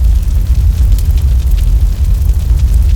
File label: Fire-Loop; 1944 Battle Of The Bulge